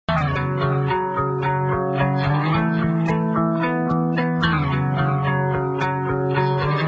mp3 bad

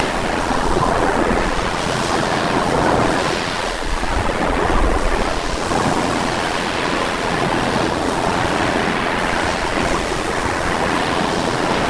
waves

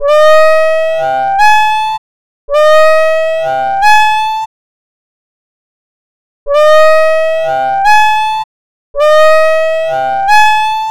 Glissando; ModelesPhysiques; Inversion

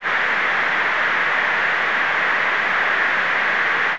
applause

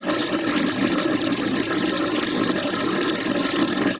sink

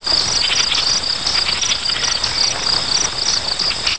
insects

